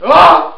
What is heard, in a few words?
Scream; NPC Scream